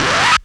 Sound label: FX; AMG Megabass REMIX! VOL-2